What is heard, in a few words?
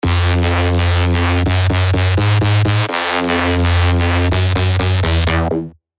Vitruvian Wave, Serum 2; revealed serum 2 reese renaissance